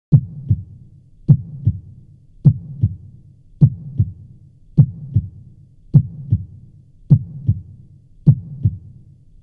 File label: heart beat